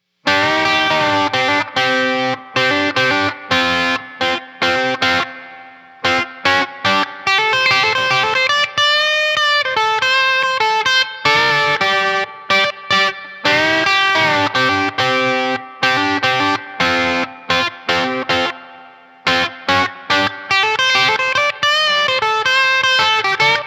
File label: G&L S500 CPR Super D